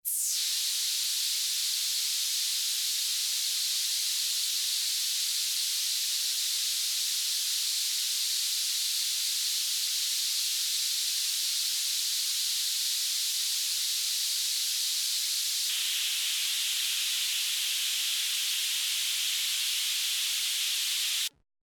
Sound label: water bathroom; Little Computer People; gamesoundopedia